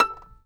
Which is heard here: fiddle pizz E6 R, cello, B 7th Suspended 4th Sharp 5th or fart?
fiddle pizz E6 R